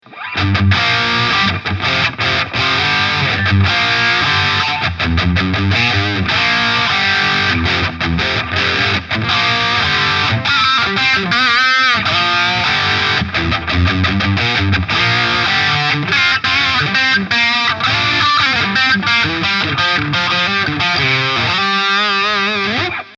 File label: red 50 Gain steg